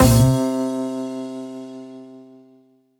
Auto Chicken Machine sting; Order of the Stone; Minecraft: Story Mode

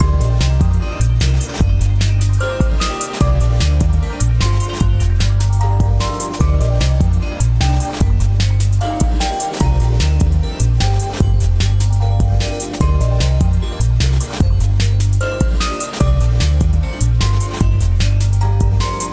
BGM; Adventure Player; psp

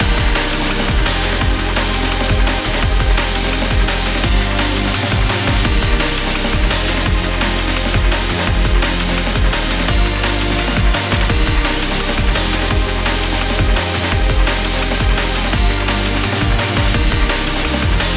Vapor Wave Ocean Main Loop; ArcRacer

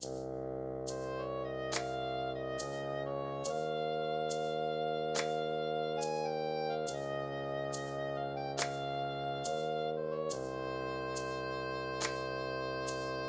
Dance Sad; PeaDance

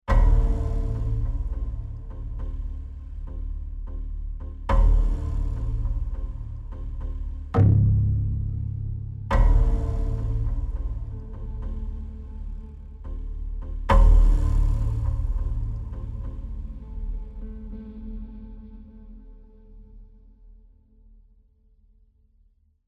Tension Mood; the last of us

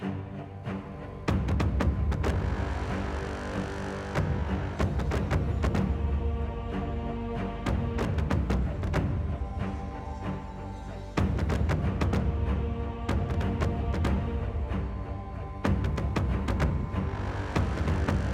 Battle Combat Battle; Eon Altar

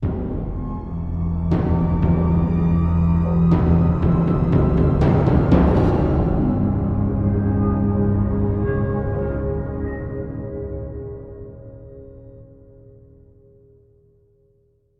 attack music; darkness within 2 the dark lineage

